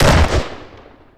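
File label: shotgun fire distant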